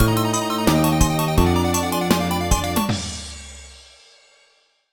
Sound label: Lose; mario vs. donkey kong minis march again; nds